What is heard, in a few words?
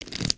Pet Dragon Scratch; creatures pets